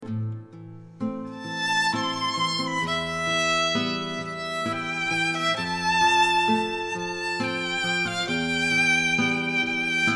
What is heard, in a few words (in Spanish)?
guitarra y violin